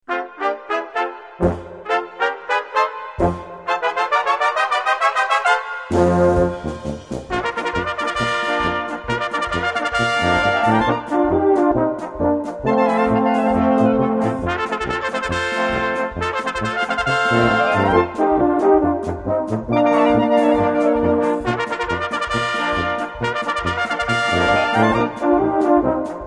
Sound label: Fun Polka